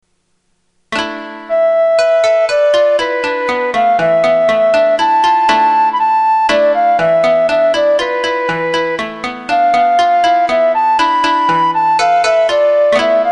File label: opening koto